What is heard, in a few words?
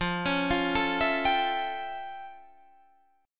F Augmented Major 9th, Guitar, Open D